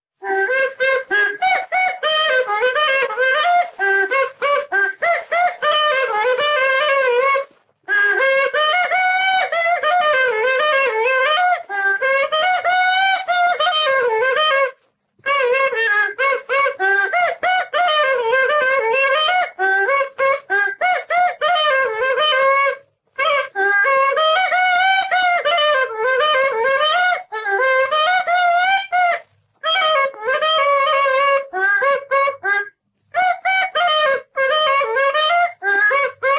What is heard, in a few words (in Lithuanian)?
mazurpolke, suvalkija